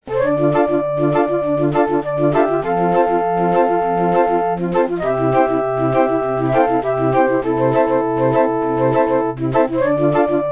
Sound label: Song of Ocarina